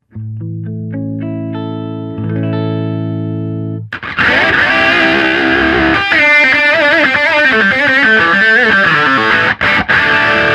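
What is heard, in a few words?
sustainer with booster hi, pedals; tone bully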